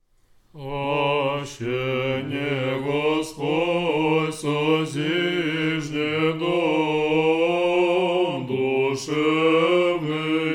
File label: second antiphon tone 8